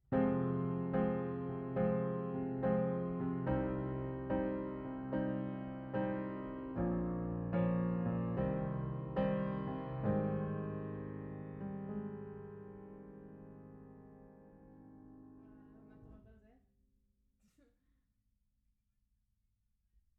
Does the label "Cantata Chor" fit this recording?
No